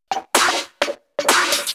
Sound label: Electro Loop 128 BPM; Vengeance Samples